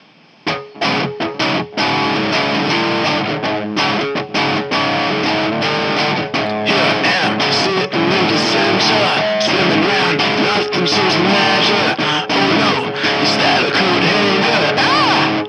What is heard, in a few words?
pringle can